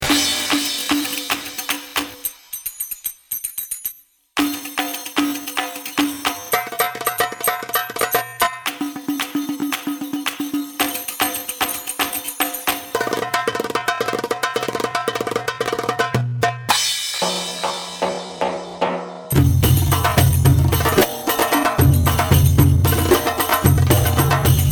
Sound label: sunshine solo slow